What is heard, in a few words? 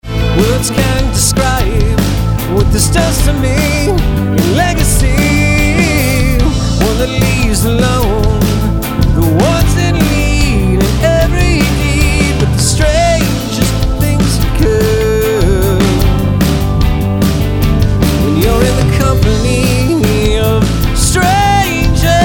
VOX MIX